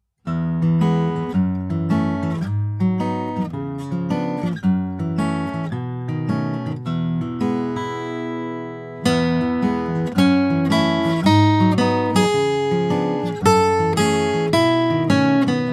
Bright BG; Bicycle

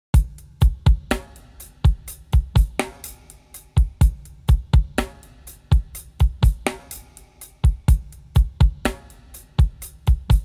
Her Guitar; 리듬BG

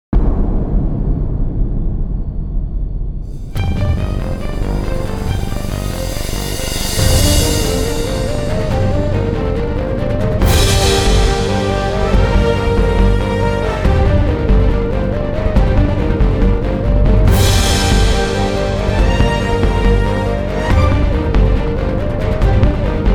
Epic Orch Intro

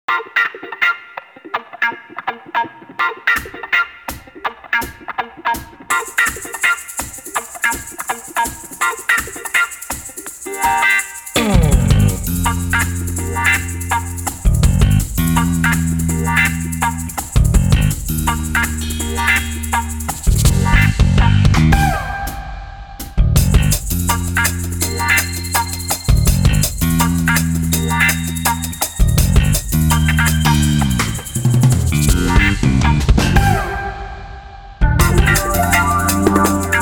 Funk Wah; Funky

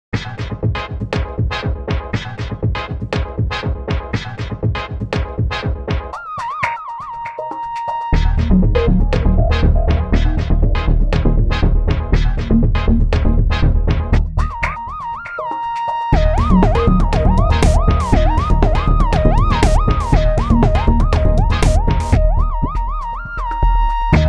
Techno

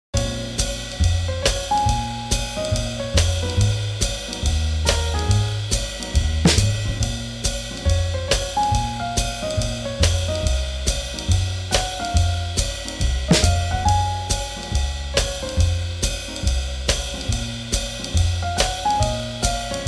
Swing Jazz

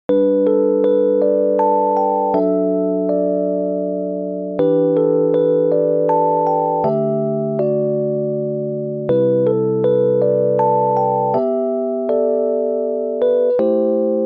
Newage, 감성

